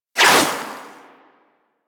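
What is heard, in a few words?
Primary crossbow Tail; Warframe; Tenno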